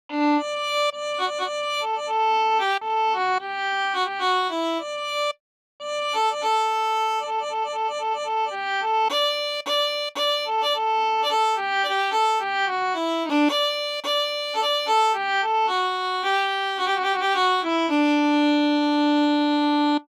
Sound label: Guitalele